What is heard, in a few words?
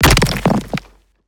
designed rocks impact hit big lfe heavy designed; fvtt sonniss gdc audio